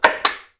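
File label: latch click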